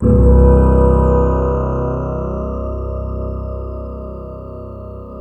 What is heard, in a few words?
T-WAVE, Synth Waves